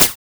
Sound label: lever